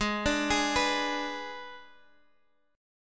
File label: Ab Diminished Ab Guitar Half Step Down